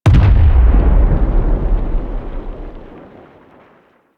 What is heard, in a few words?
Teralyst Far Step; Enemies; Steps; Warframe; Eidolon